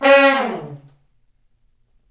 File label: BRS SH FALL, Brass Sections, BRS_Fat Falls; Roland LCDP06